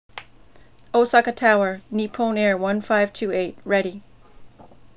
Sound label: take off, airplane